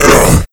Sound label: pain; sleeper